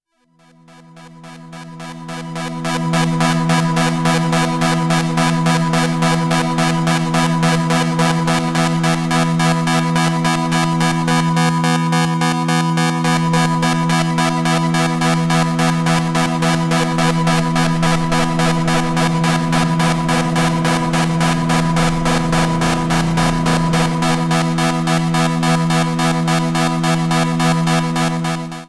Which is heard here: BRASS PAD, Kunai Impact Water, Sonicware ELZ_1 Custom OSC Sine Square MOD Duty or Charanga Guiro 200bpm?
Sonicware ELZ_1 Custom OSC Sine Square MOD Duty